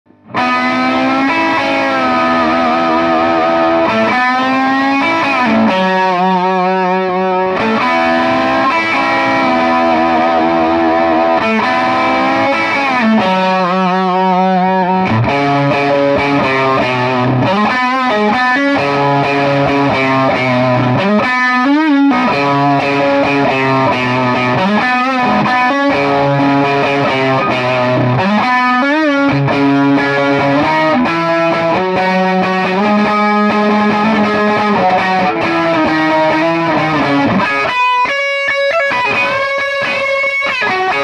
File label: fuzz